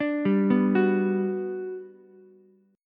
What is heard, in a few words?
G Major 7th, Ukulele; GM7 arpeggiated